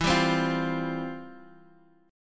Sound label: C Suspended 4th E; Csus4/E strummed; JGuitar